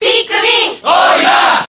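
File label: Cheer Japanese, crowd; Olimar; SSBB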